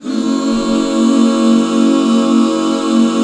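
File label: HAL F#7 left, Back Chords; Sound & Vision - Gigapack II CD 1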